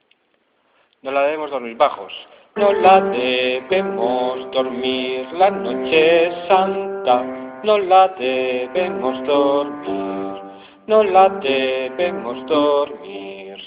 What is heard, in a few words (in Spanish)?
bajos